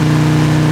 GTO rpm, Engine; Cars; XBOX

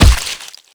hit blood, skater; Tony Hawk's Project 8; XBOX

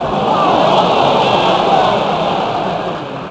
groan, Crowd; David Beckham Soccer; XBOX

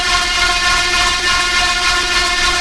idle, ships engine; Quantum Redshift; XBOX

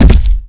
jump; Pirates Of The Caribbean

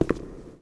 footstep subway

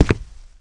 Walk Concrete; Player; Footsteps

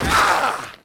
FALL, Rider

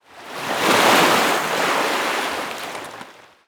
Waves, ambient; Totaled; XBOX

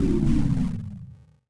generator end; naboo

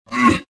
gasp

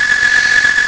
Watcher Hum

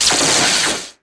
lightsaber deflect laser; weapons

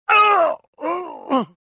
choke; rebel

